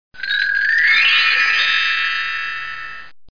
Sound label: Logo lift off; How Many Bugs in a Box